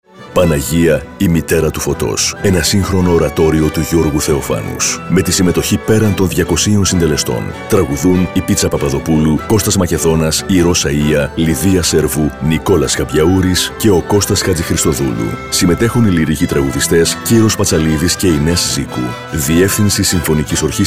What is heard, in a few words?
Oratorio trailer radio; Kyprou